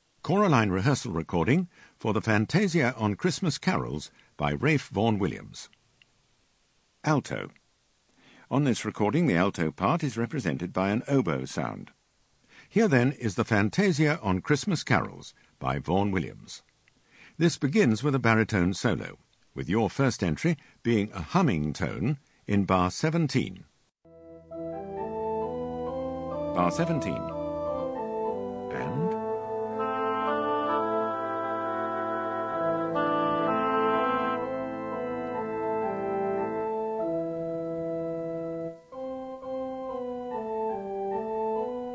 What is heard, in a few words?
Alto; Vn Wllms Fantasia on Xmas